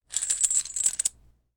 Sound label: Chain wrapping